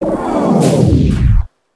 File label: shut, ships; Quantum Redshift; jaya